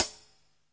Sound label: real Hi Hat; REAL LIVE KITS; A Real Freakin Drum Kit